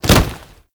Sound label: Generic Grab; Warframe; Characters; Tenno